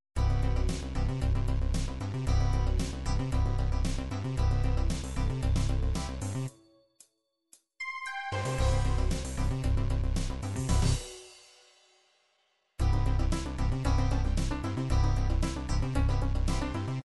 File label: 16 beat pop, midi